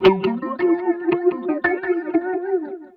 Cmin, Guitars; Total Drum&Bass; 90 s Sample CDs

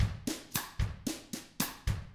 COUNTRY, Drums; ILIO; Double Platinum Drums 1

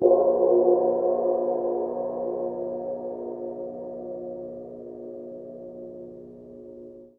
Cym p, Cymbals, Orchestra; 90 s Sample CDs; PowerFX - Downtown Orchestra

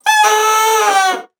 BASSOON; Xsample VOL-6

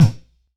Bonk Clack, Kicks; Airwindows

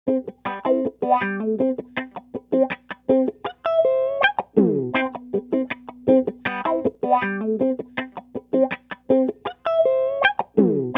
FUNKY LIL WAH, Funk Gtr & Wah; PlatinumPhattCD2; E-MU Producer Series Vol. 8 – Platinum Phatt

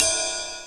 Kawai R-100 RIDE

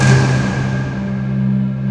sound effect, film score; AKAI S6000 CD-ROM - Volume 1

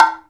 tsuzumi H, Ethnic Percussion

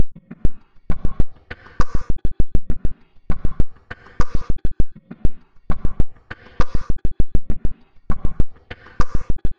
glitch loop for artcore

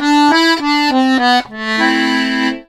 Complete Accordions; USB Soundscan vol.40; AKAI

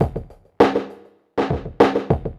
drloop, drumloops, Vinyl Attack; Masterbits

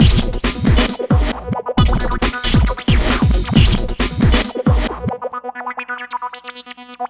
Old Schoolin, 135 Bmin, Hardcore Breakbeat; Big Fish Audio - Hardcore Breakbeat Action

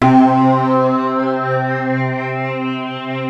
TRANS WAVES; Rubber Chicken Software Sample Bank

